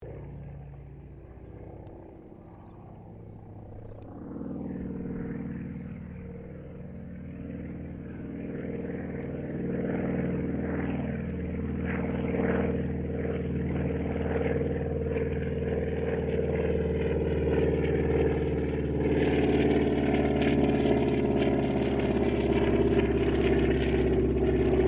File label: Ceres Winjeel Wirraway pass, stereo; Mixed aircraft squadrons; Airplanes; Vehicles